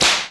Whip, Korg M1; 300 Drum Machines

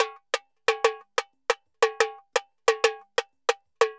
Tamborin 120; Best Service; Festa Latina VOL-1; Drums & Percussion

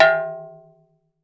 low warm, cowbells; Voice Crystal - Steve Reid Definitive Percussion

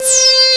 SYN ANA, Dance Synth; Sound & Vision - Gigapack I CD 2 (Roland)

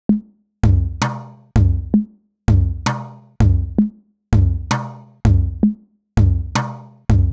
Tom Loop 130, Perc Loops 130, Loungin House; Equipped Music

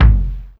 Deep Tom, Drums, Fairlight IIx

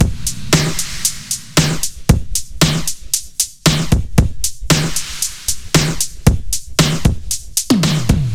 115 Beat Meany; Beats; Zero-G; Future Wave

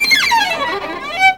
VIOLIN LINES; Best Service; World Colours

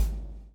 BLOW; Spectrasonics - Backbeat

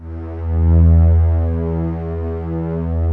E2-SLO LO, KEYBORD

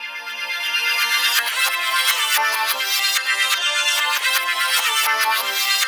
INDIA ROCK, Synthy Tonal; Distored Reality

